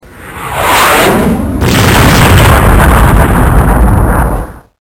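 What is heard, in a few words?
air strike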